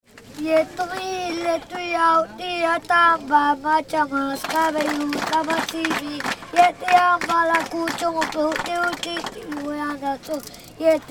mexico yucatan chichen itza ruinas

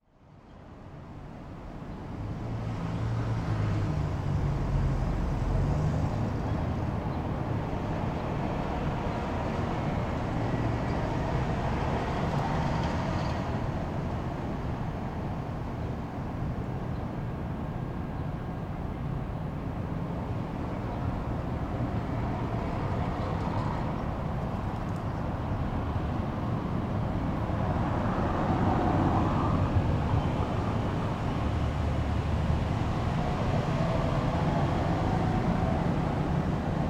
catedral san rafael campanas; rafaela

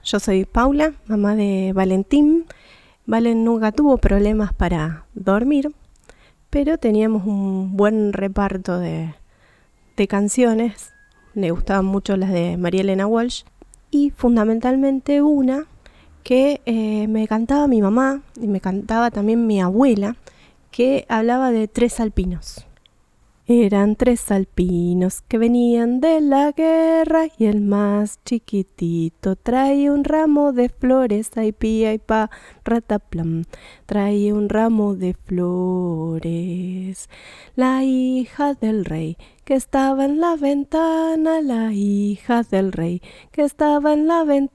canciones de cuna

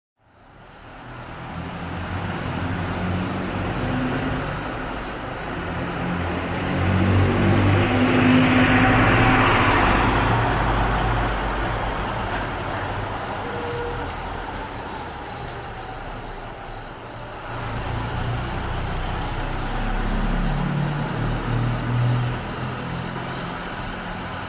calles; san luis y sarmiento

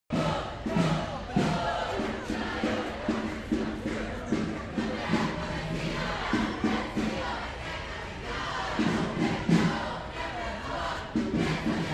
manifestaciones escrache; e duhalde candidato a la carcel; fundacion italia; Rosario

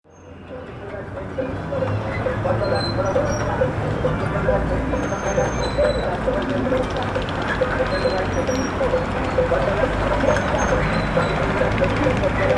plazas calesita; enrique martinez

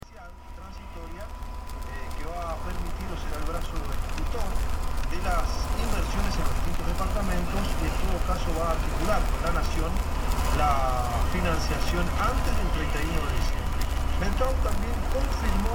ruta radio; mendoza; rn7 cacheuta a potrerillos

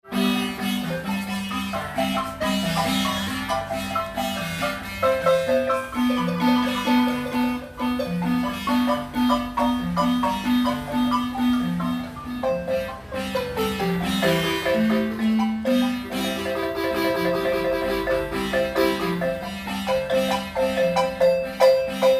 chiapas tocadores de malimba; chiapa de corzo

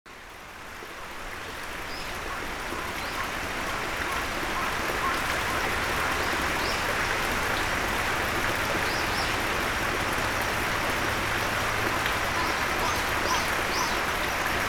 templo budista; japon; isla kyushu; ogi

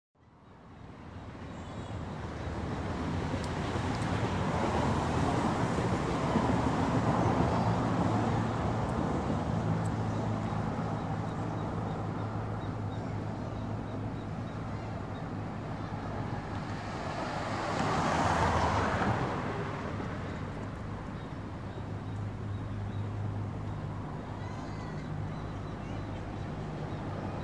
calles av belgrano; Rosario

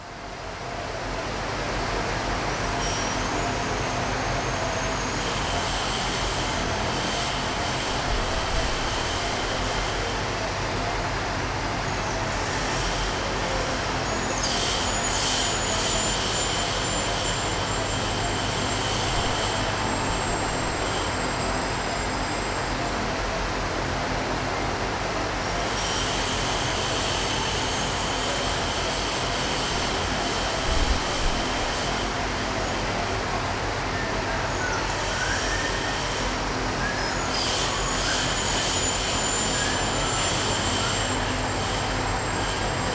comedor, puerto gaboto; pinocho

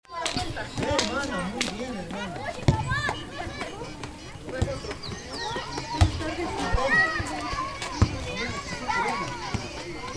estadio practica futbol infantil; bolivia; coroico; estadio evo morales

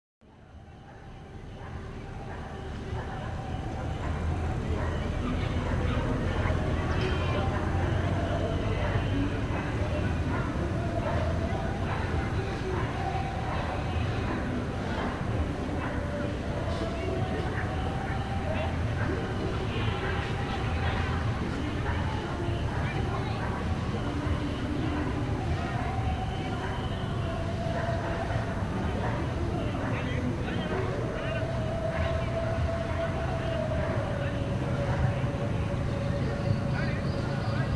calles; schweitzer y mejico

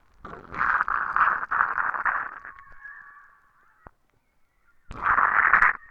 toma mate

